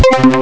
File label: mega hip hop; Amiga Soundtracker Sample Packs